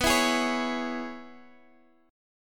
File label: Db Minor B; strummed; Dbm/B; JGuitar